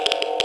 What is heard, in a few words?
geiger